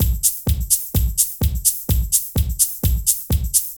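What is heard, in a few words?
drum loops, right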